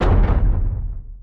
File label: Metallic Thump